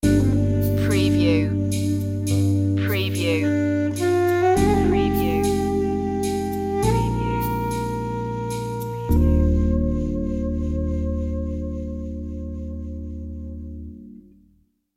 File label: sting